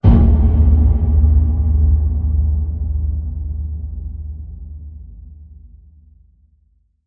Hit Low Jail Cell

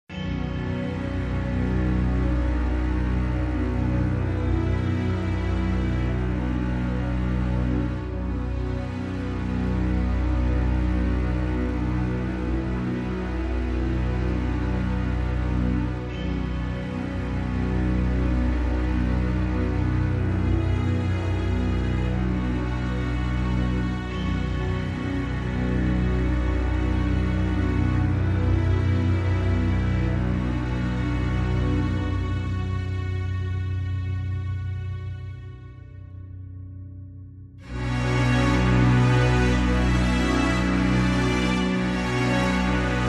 Strings

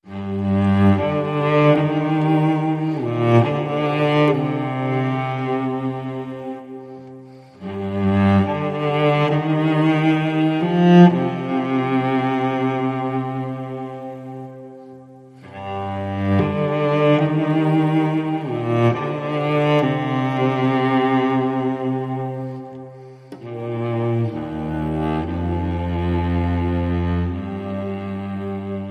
Cello

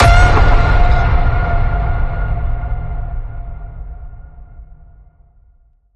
Ping It Loud, F

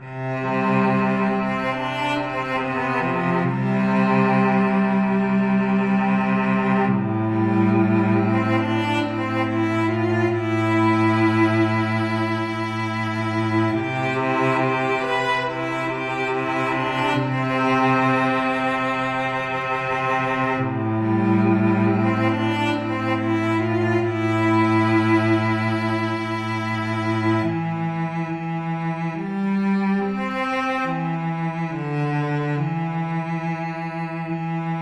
Cello Only

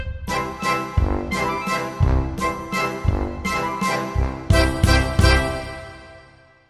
Sting

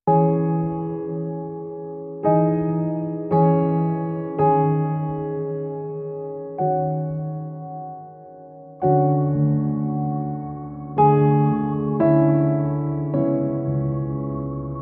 Ambient

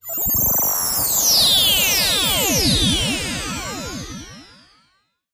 Warp Field

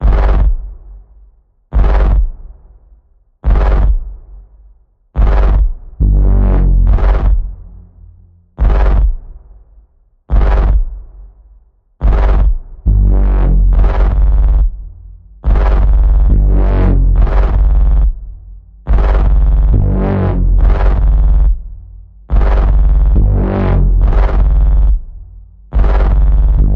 Evil Warping Bass Pulse G, Key of G

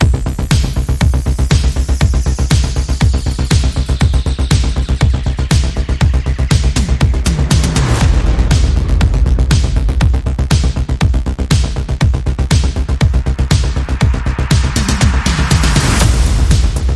percussion bass and effects

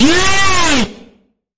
Sharp Bend, G; Sharp Bend Ahead

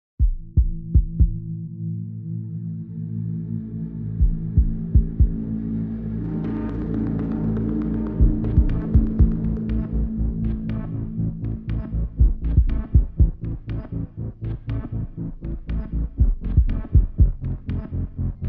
Drums Bass and Pads Only

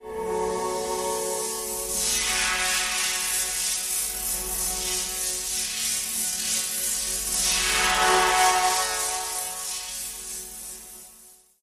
Sweep Course Music Pad

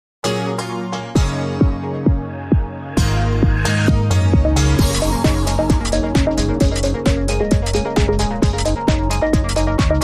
Happy Groove

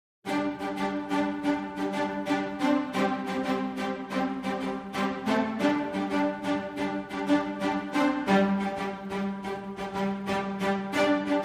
Strings

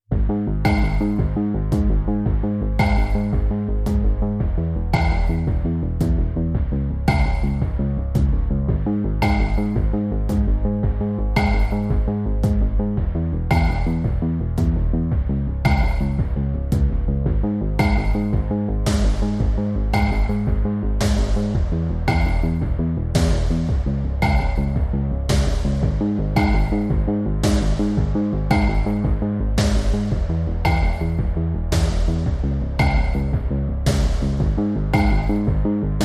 Drums and Bass Mix

